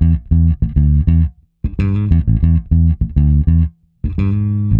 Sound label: Bass E Funk